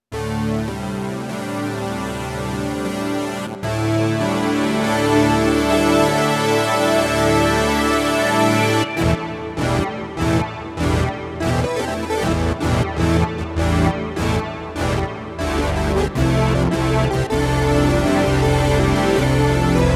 quint solo

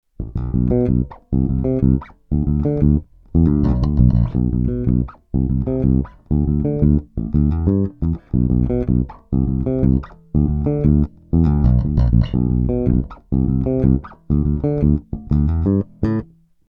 normal finger

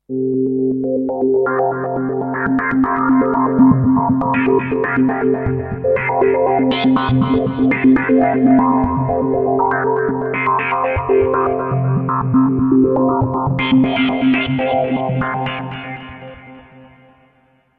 LP 8 Pol Cutoff 645 Hz Full Reso S und H

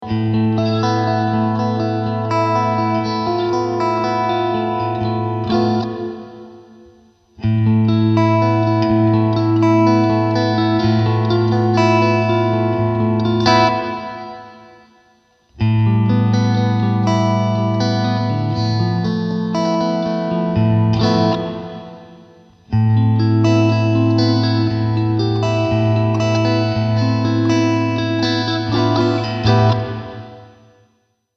Reverb

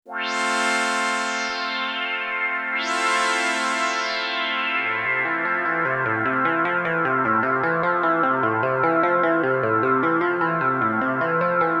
ARP Quadra Chorus; Boss CE-1

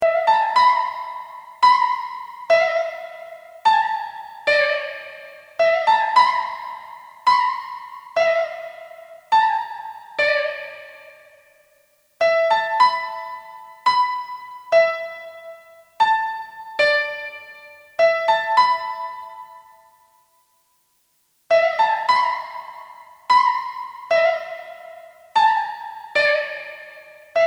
Roland SDE 2000 Modulation

